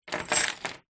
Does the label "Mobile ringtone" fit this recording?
No